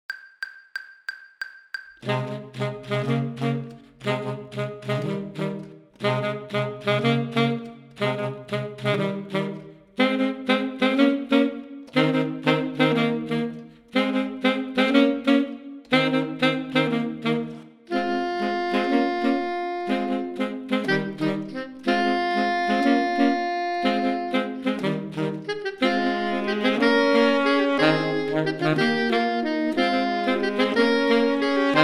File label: playalong bari; battlefield 1942